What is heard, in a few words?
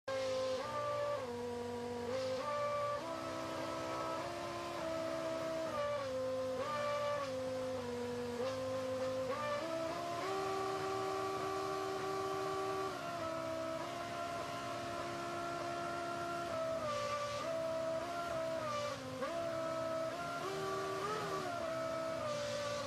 Singing Engines